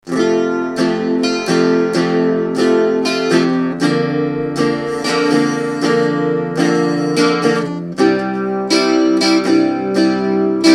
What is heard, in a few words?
strum